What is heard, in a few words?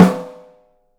OHSTSN L, 3D Drums